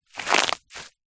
footstep single shoe very dry bush shrub australian outback; zapsplat; foley